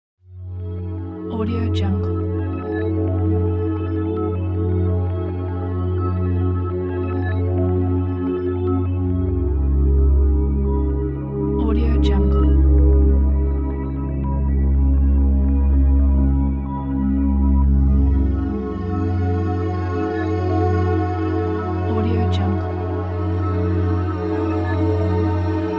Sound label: ambient contemplation; fefe75